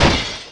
Hit Pottery; Test Drive Off-Road 3

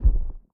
Kiwi Step Bass; lethal company

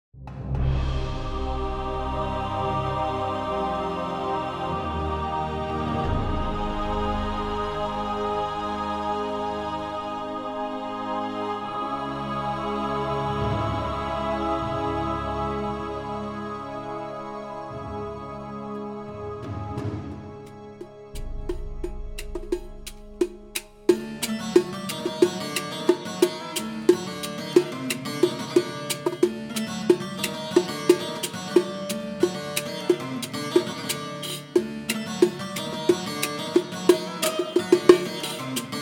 Cinematic

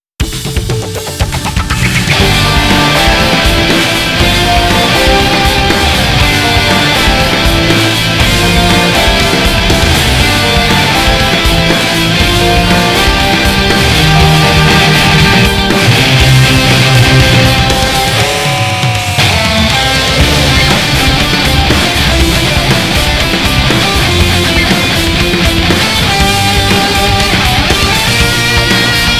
Main Menu Theme

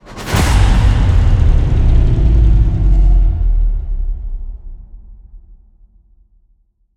Stinger Modulate

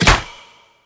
Enemy Bigclam Cell Close

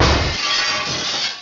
Hit Glass; Test Drive Off-Road 3

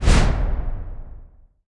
Mystery Box Start; subway surfers city sound effects

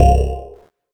SKILL NG

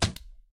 Drop Limb; Lethal Company

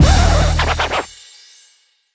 Character Crash; Subway Surfers City; Android, iOS

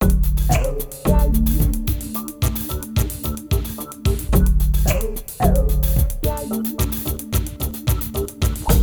Music; quest for the code windows gamerip 2002

